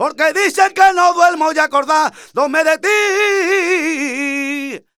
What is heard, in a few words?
male cantaor vocals, Flamenco